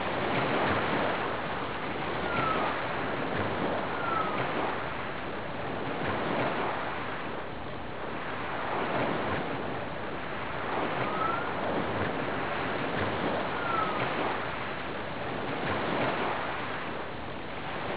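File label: Environment beach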